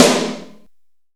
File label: LIVE SNARE